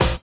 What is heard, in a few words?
collision; Spectrasonics; Metamorphosis